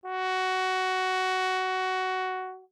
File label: Tenor Trombone ff Gb4; Brass